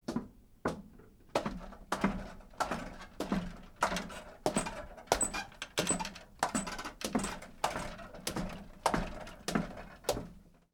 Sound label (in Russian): shagi po rasshatannoj skripuchej lestnice